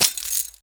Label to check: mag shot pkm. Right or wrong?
Right